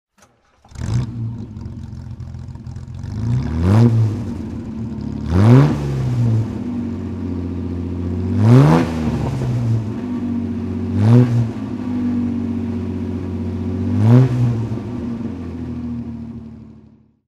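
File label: austin healey 3000 mk2a throttle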